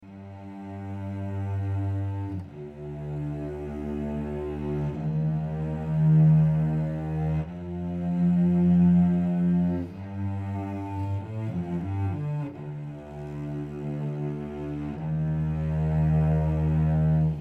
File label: strings, sad loop; Loops